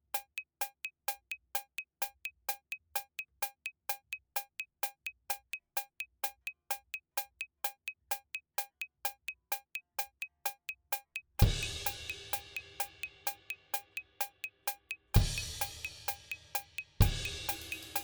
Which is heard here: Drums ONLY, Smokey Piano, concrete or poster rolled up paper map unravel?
Drums ONLY